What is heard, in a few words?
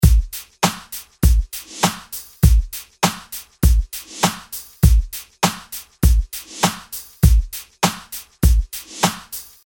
Drumloop